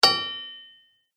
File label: Metal Low Hit; rock